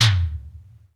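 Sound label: electronic drums tom; Crypton - DrumBase5000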